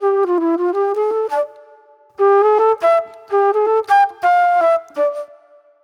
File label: mellow soul flute; Sonic Foundry (Sony Creative Software); Manhattan Flutes; Soul 1